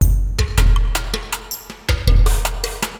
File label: DRUMLOOP AMBNT 160; Ambient